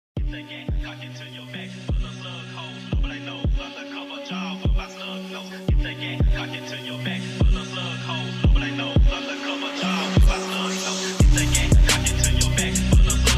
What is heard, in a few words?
Phonk Freestyle